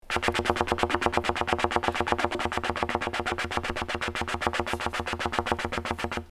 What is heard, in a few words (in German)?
Trompete als Hubschrauber